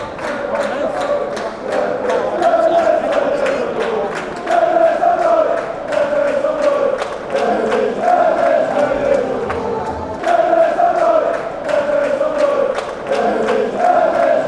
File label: dente dente dente, fanchants